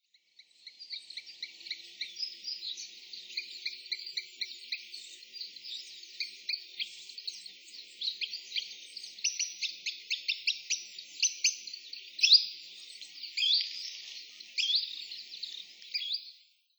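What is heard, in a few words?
Green Sandpiper; kusashigi; watarase; koe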